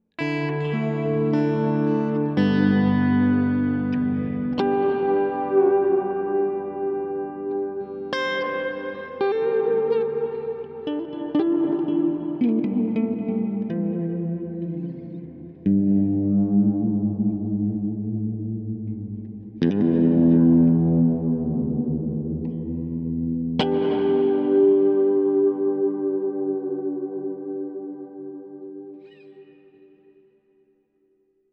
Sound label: Ambient Deep; Walrus Audio Fundamental